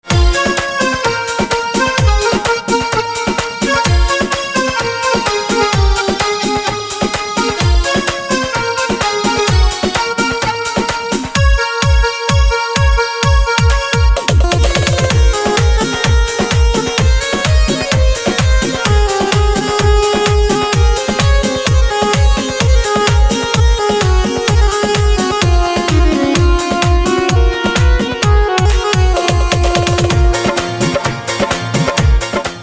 Accordion

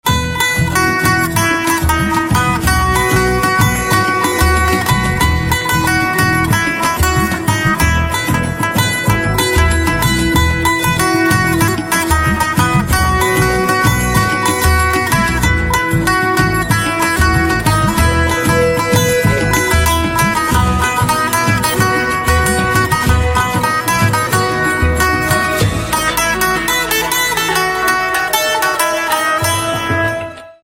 Mandolin

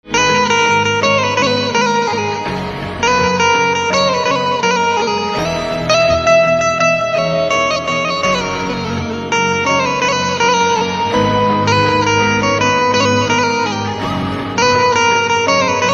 Banjo

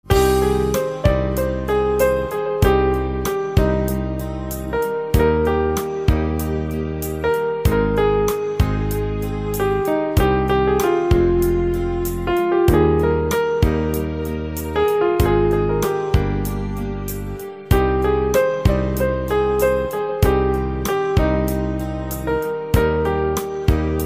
Piano

